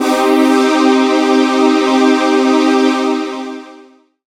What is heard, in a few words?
pad, Dance Synths Break Pads